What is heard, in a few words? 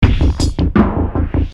depressing rock music